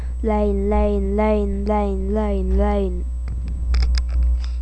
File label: rain